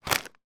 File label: food crisps potato chips packet pick up